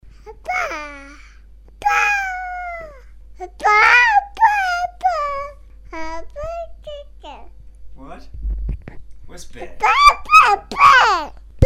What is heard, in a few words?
baby voice